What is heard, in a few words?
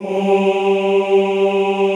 OOH G2, Classical Choir; Best Service; Extended Classical Choir